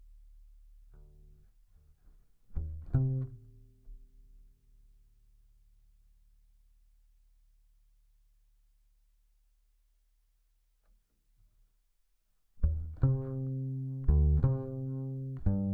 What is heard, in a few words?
Bass DI